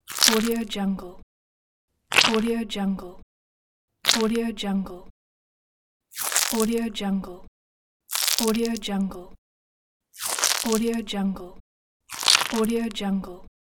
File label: squeeze fruit; teldrassil